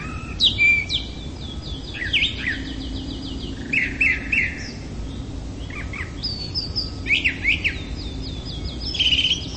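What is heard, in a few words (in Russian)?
размеренный свист пересмешника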